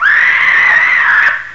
Die-Lava; Monkey Shines 1.1.2

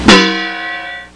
error; animwave

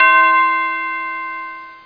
TUBE BELLS; Dark Forces Demo; Dark Forces Instruments 1

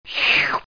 slurp; How Many Bugs in a Box; juicebar

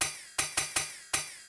HIHAT AMBIENT 160, Ambient Drum

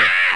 AHH

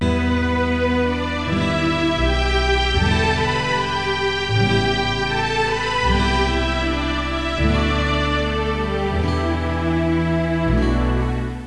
music

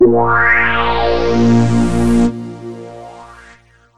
A#, Bass Multis

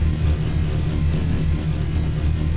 apc fly; MACHINES

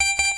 new message; whosin

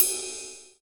CYM RIDE; cymride2

